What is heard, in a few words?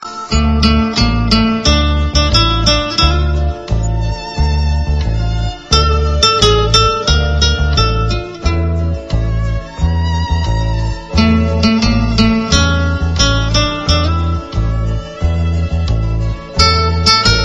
guitar ringtone